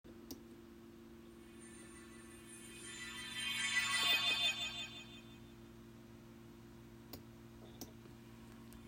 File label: Elf twinkle